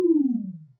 hit miss

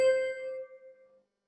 sim start

